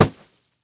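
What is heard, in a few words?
short quiet hit on a soft object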